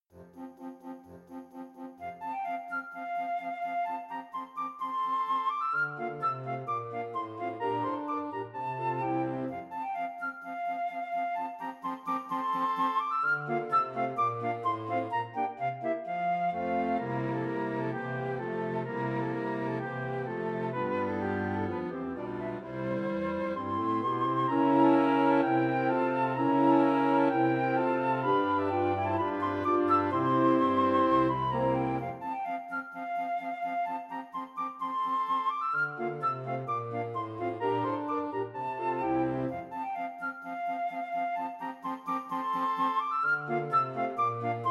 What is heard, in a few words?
tambourin